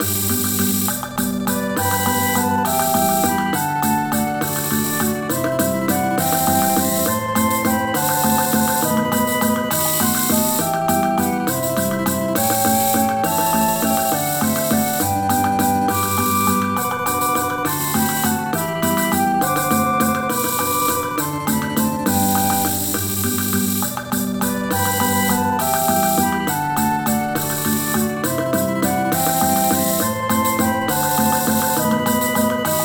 Triumphal Song and Dance; MTWFC VOL2 Parts1&2 Audio Accompaniments

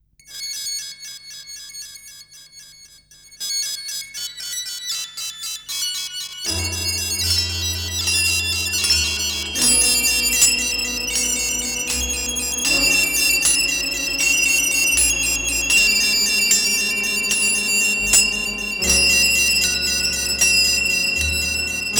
Backing track

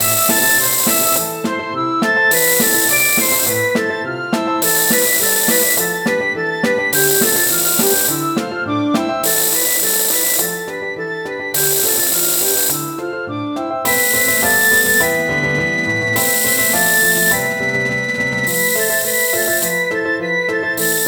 Windmill Dance; MTWFC VOL2 Parts1&2 Audio Accompaniments

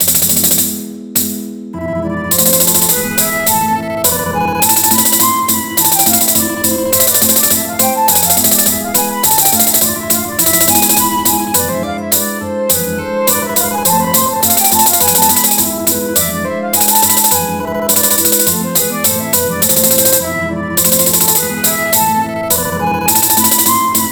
Maltese Song and Dance; MTWFC VOL1 Audio Accompaniments

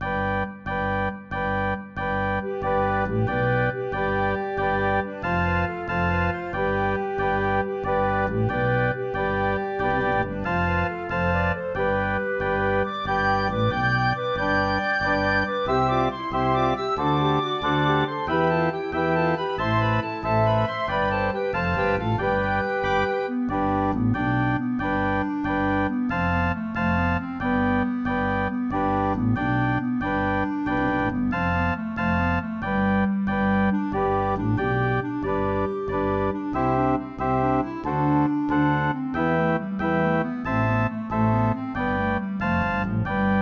Jig; MTWFC VOL2 Parts1&2 Audio Accompaniments

